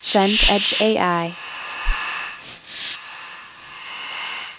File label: horror whisper texture